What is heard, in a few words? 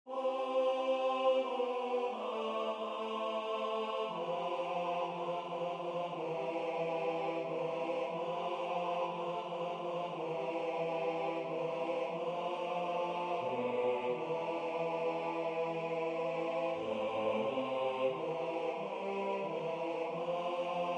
Bass